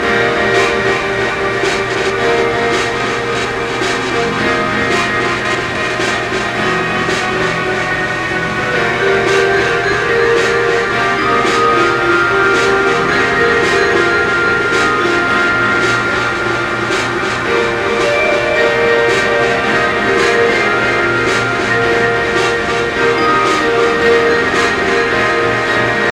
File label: Mall Muzak; Postal 2 Paradise Lost